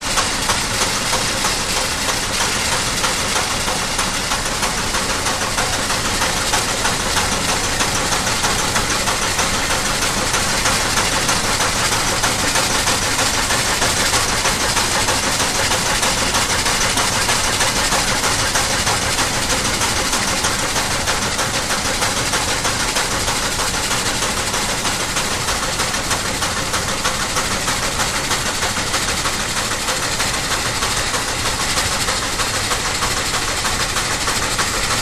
Challenger Full Out, steam train

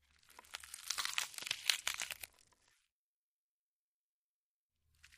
Breaking Tearing; punches kicks hits bones

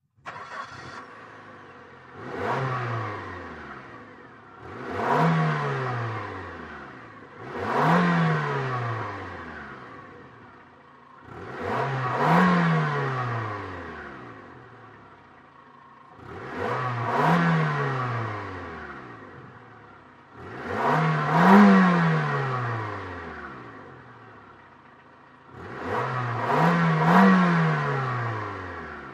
Sm Car Eng Rev Off, compact

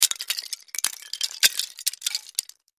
Wood Shims, debris

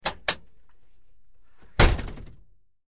Humvee Door Opens; door, truck

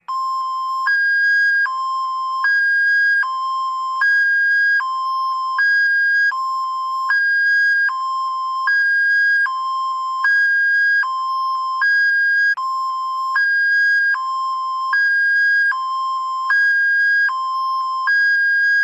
Car Alarm

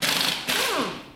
Drill, Pneumatic Air; air wrench & ratchets

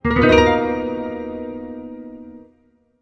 Music Effect Synthe, guitar

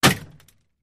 Bullet Impacts Metal

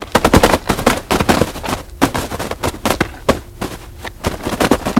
Bird Wing Hard Flaps; wings

